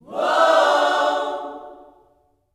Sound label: WHOA-OHS, Vocal Gospel